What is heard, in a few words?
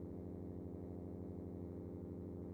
Escape El Hum Loop